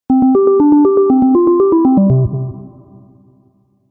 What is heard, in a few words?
Soft Subtle Notification; Musical